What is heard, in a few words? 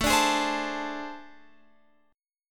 A Minor Major 7th Double Flat 5th, down, Guitar, Open F; AmM7bb5; strummed